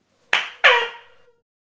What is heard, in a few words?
IR Maya Pyramid; 10m 91step